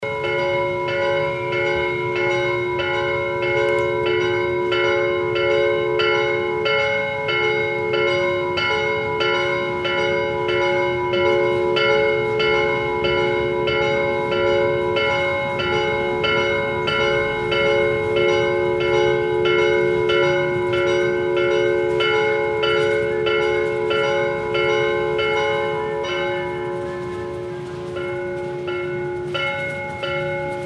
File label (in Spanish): campanas de catedral de Ayaviri 8am